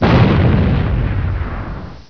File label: explosin, explosion sounds